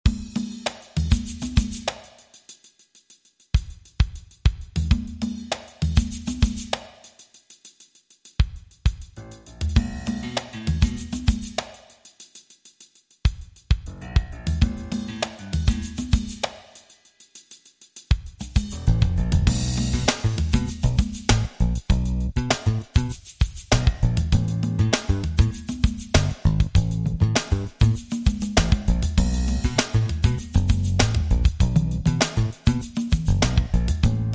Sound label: Jazz, No Melody